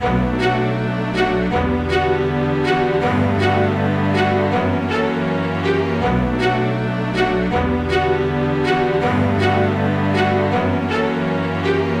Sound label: Future Bass String Loop, 160 BPM B Min; Instruments; Cymatics; Future Bass Starter Pack